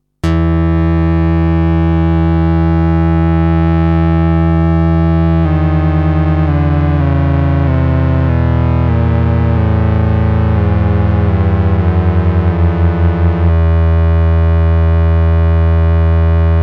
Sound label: pro12 detune